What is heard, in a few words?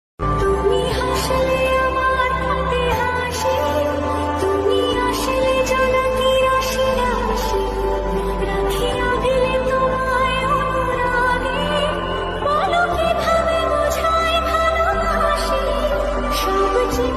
lofi bengali ringtone